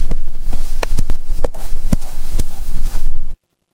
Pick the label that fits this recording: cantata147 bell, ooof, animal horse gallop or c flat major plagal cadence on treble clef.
animal horse gallop